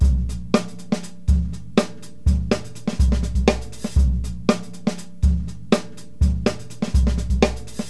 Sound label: butter flange